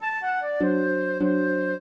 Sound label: trio, flute harp; Mozart